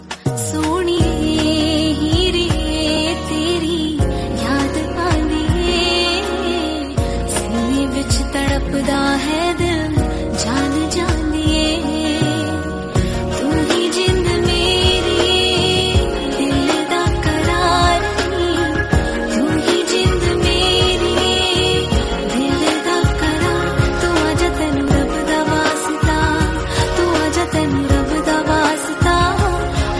female sad

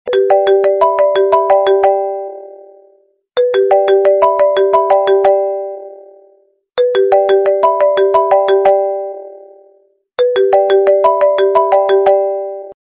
iphone sms

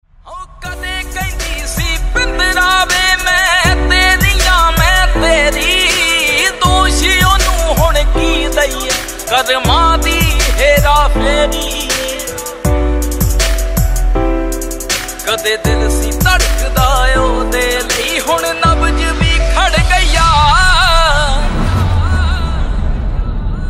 Punjabi Song

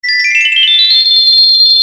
bells arpeggio magic

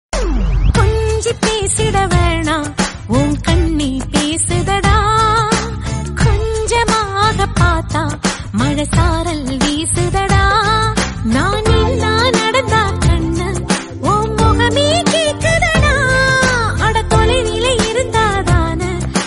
Female Version

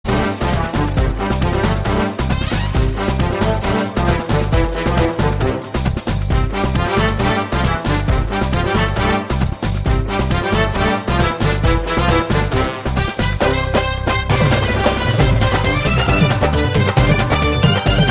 brass band instrumental ringtone

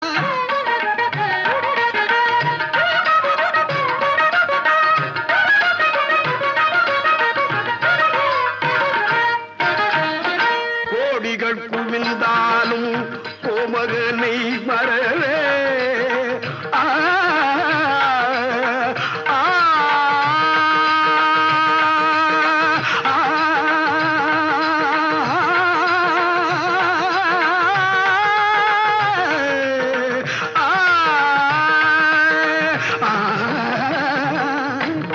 Devotional, ringtone

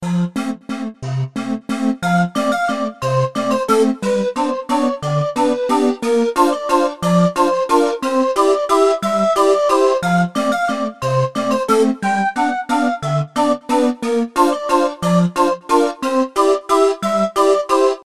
circus calliope

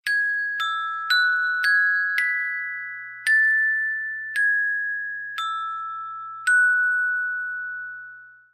ice cream trucks go

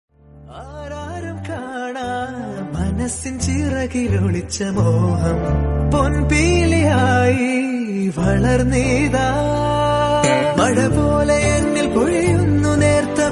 malayalam song

